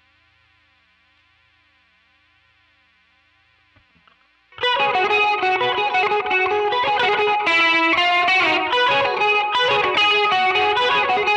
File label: quilter solo